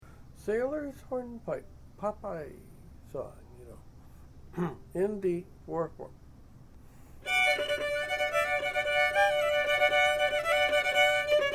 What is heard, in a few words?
Sailors Hornpipe, Fiddle; Fiddle chords